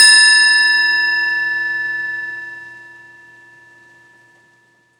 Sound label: bowl